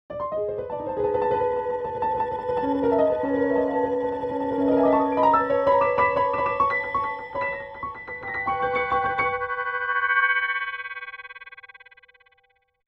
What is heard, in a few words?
Piano parts with electronic extensions; melodic gestures preceived as a Gestalt; Spectralism and Microsound